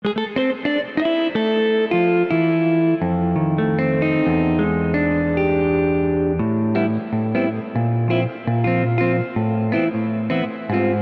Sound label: Jazz Guitar - Deep Reverb; OldSkoolVerb